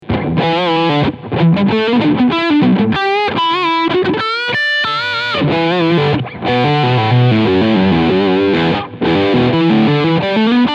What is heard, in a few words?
Riff Humbucker